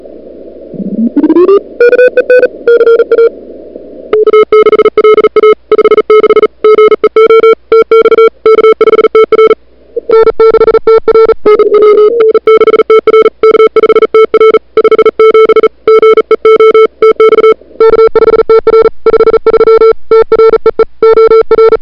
Sprint CW All; listen to two consecutive CW sprint QSOs take place on the same frequency; The Sprint Survival Web Page

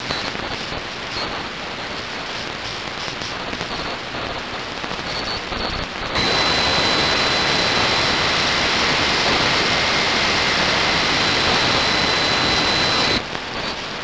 Noise 2.48MHz

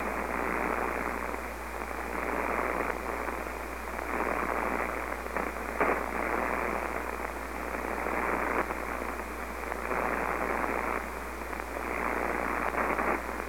Noise, 160

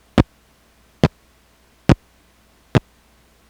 21 MHz AM 12 kHz, noise

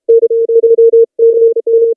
zerobeat

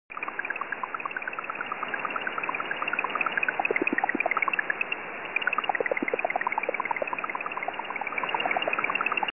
Intruder 14008MHz

